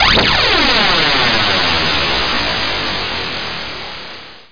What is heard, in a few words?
Sound engine; The Amazing Spectacular Ordinary Bottle